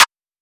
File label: phonk perc; maximum; phonk toolkit; rocket powered sound